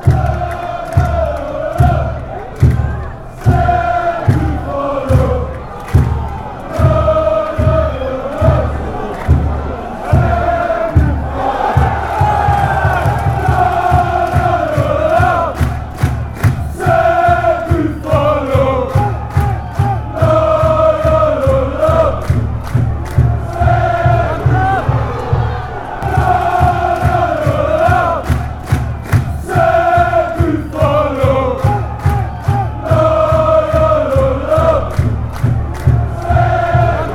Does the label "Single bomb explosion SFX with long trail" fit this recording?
No